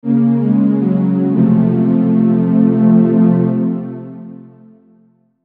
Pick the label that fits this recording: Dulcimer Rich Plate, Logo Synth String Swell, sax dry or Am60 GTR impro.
Logo Synth String Swell